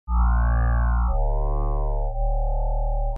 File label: Sci-Fi ringtones; clunky, analog sounds of vintage sci-fi films; beepzoid